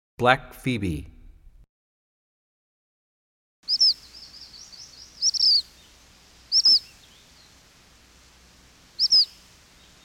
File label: Black Phoebe, bird songs; 101 Bird Songs